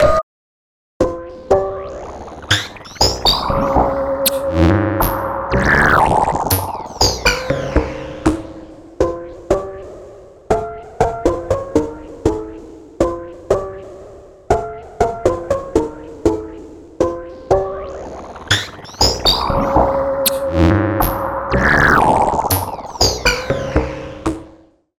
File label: Soniccharge Synplant 2 Raindrop